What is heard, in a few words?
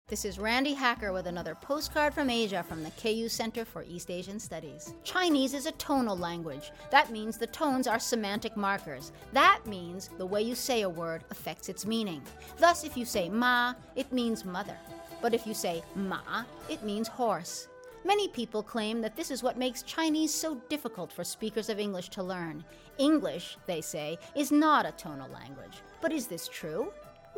tones